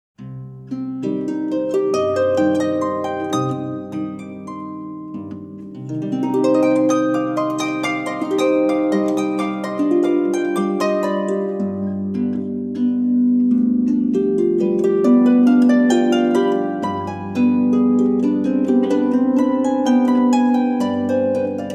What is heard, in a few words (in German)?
Landlereien in Moll; Pankower